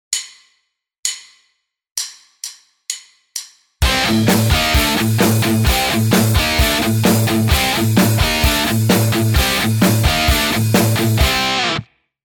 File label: Riff, guitar